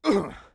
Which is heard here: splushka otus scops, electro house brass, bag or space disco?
bag